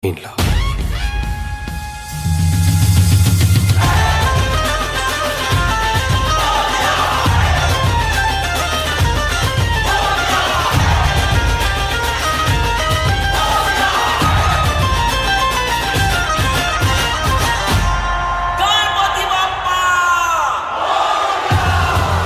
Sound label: Banjo